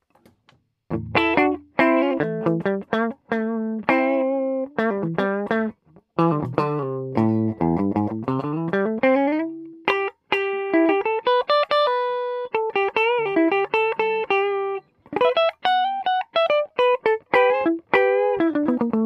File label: ES335 CLN